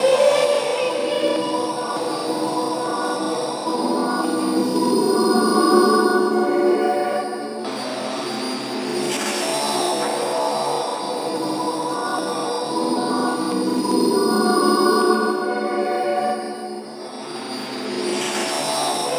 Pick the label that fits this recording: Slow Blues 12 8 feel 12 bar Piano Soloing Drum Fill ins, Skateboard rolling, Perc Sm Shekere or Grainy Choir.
Grainy Choir